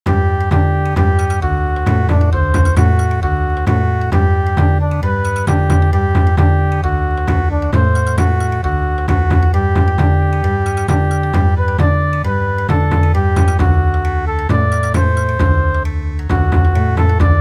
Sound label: Bon Odori, midi